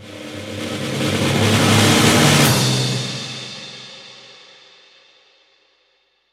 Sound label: Sweeps Medium